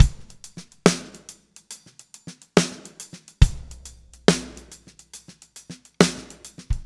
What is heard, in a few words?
LA SHUFF